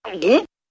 Big Single Gulpie

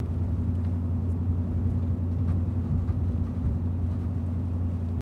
Cadillac Interior Running

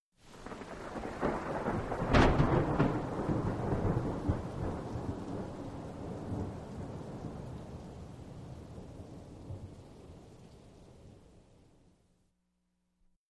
Rolling Thunder

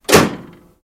Buick 65 Hood Slam; trunks & hoods

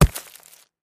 Concrete Chunk Drop; rocks & dirt

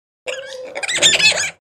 Monkey Spider Chirp S

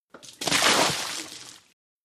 Bucket Water Slop

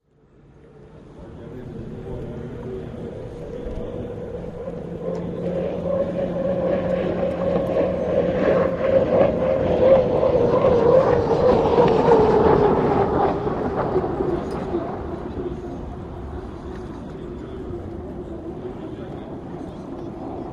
Hydroplane By Ambie

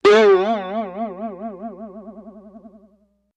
Boing Box Vibrato

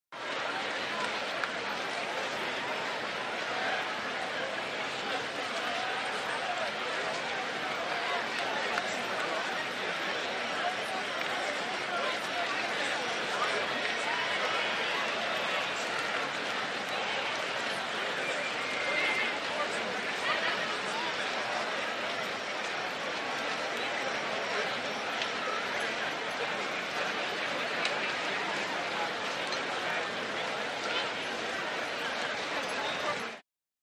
Football Crowd Busy, sports arena football

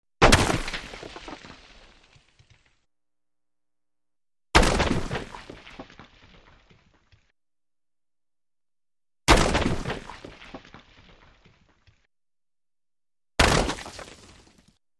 Big Rock Hits, 4x; rocks

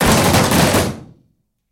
Machine Gun Bullet I, metal impact

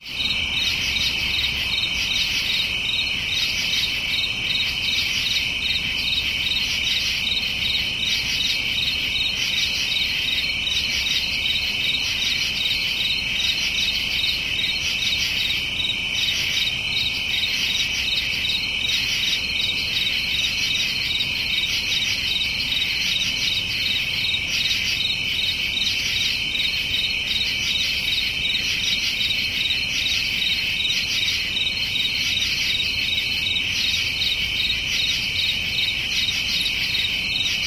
Continuous Cicada Ratchet With Close Perspective; cicadas; FORREST BUGS

